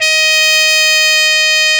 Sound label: D#5 C, BRASS; AKAI S-Series CD-ROM Sound Library VOL-8